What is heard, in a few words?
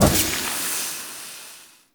Root Cave Magic Spore Puff; Ambience; Props; Neathuns Root Cave